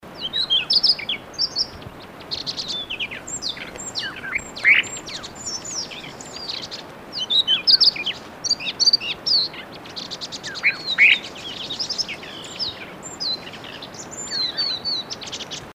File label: Mystery Bird, birdcall